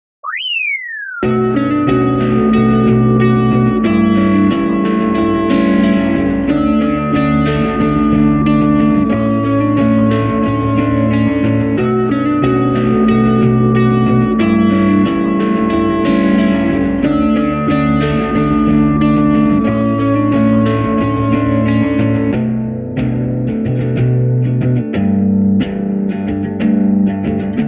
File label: 182bpm Dmaj instrumental